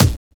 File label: kick, Drum and Bass